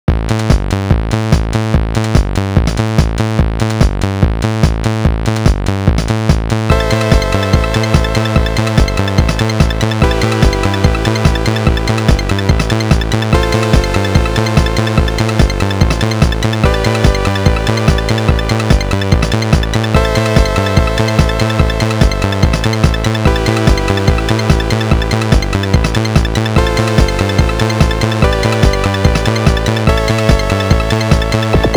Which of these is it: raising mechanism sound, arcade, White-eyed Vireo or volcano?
arcade